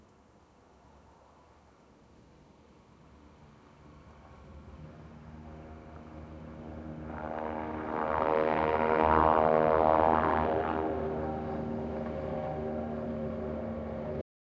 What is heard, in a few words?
de Havilland Canada DHC-2, aviation